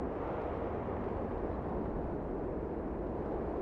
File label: Night Ambience